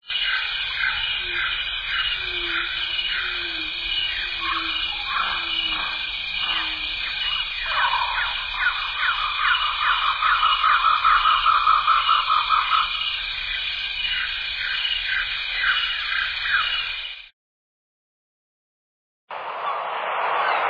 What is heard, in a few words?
Original Chillout long Loop